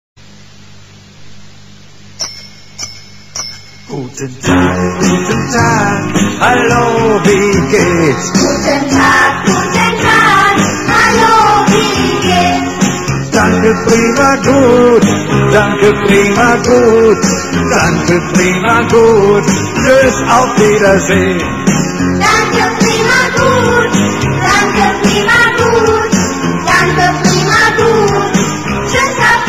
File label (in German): Guten Tag Lied